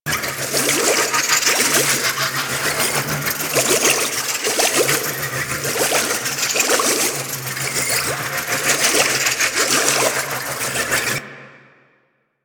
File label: Alchemist Distill Ability Loop; Warframe; DE; Warframes